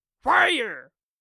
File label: Fire